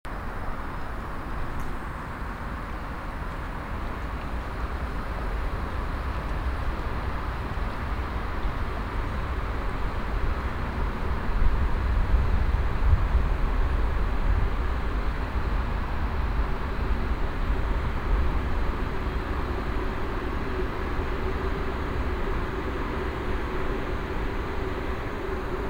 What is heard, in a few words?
Train Platform Distant Motorway, Stockholm; lectroteque